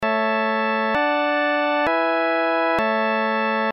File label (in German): kadenz modern